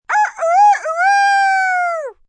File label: Rooster, rooster (human voice); Birds; Animal sounds